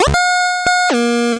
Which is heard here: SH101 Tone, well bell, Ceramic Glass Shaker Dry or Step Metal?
SH101 Tone